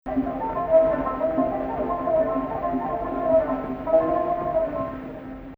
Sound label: Rast Fein Ya Gameel Rast; Maqam Rast